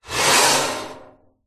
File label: household metal fireplace guard door scrape